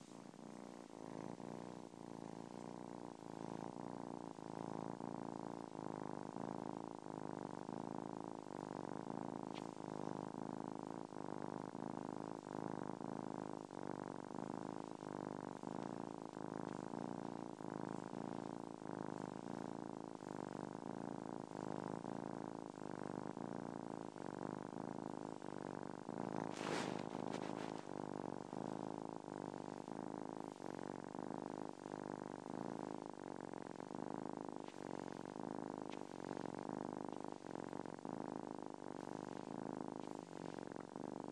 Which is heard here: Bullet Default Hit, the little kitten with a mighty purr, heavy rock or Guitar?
the little kitten with a mighty purr